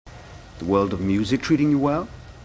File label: music